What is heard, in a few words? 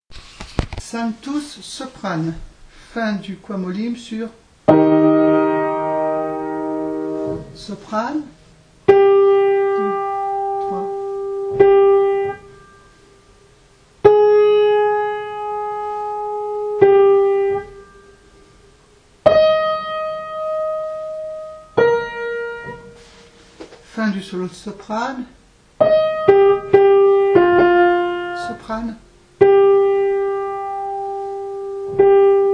Sanctus Soprano; Niccolò Jommelli, Missa pro defunctis